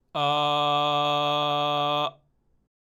普段の喉頭のGの声で「あ」

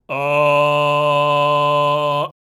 巨人の喉頭のGの声で「あ」

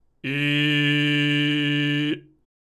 巨人の喉頭のGの声で「い」; 次に、巨人になったつもりで大きな空間を保ったままグーの声で「い→え→あ→お→う」と母音を切り替えていく。

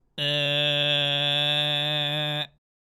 小人の喉頭のGの声で「う」

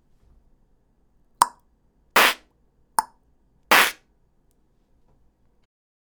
clap, ヒューマンビートボックス

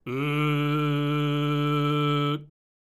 巨人の喉頭のGの声で「う」

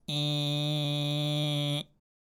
小人の喉頭のGの声で「い」; 最後に、小人になったつもりで小さな空間を保ったままグーの声で「い→え→あ→お→う」と母音を切り替えていく。

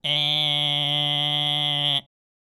小人の喉頭のGの声で「え」

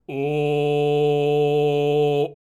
巨人の喉頭のGの声で「お」

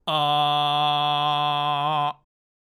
小人の喉頭のGの声で「お」

